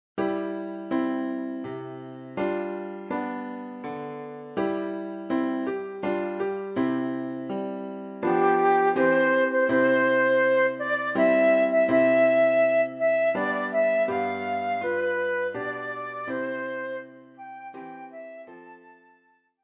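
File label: flute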